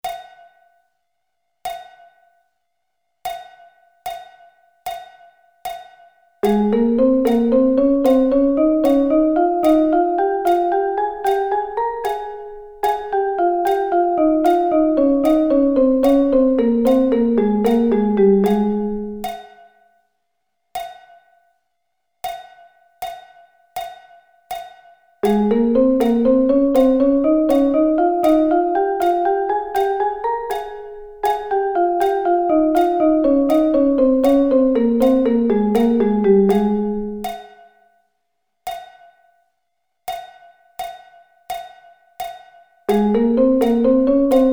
Ab Double Sticking

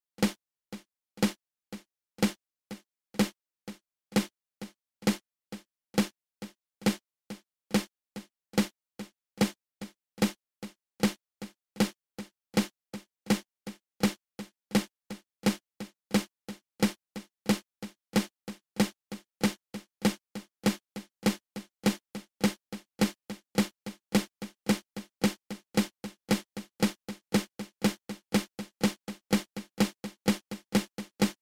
inverted flam tap open close open

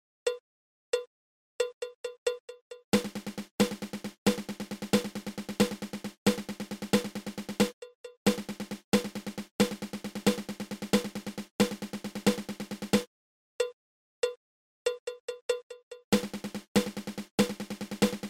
Paradiddle diddle

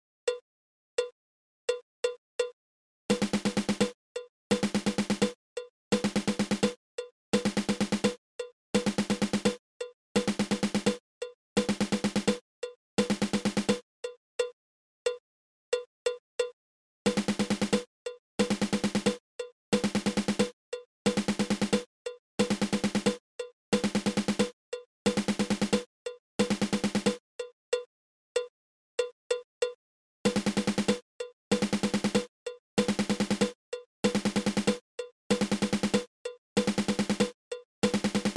Single Stroke 7

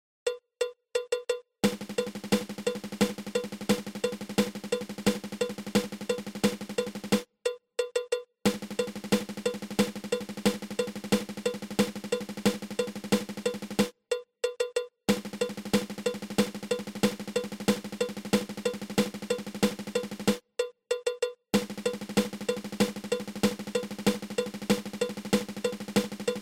Triple Paradiddle

